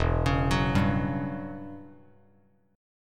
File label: E Minor 6th Add 9th E down Bass Standard; Em6add9 arpeggiated; JGuitar